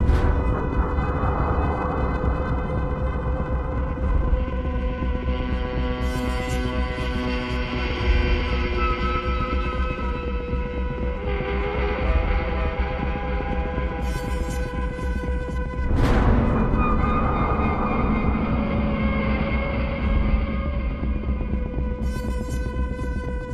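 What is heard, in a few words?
intro synth guitar loop; transformers war for cybertron gamerip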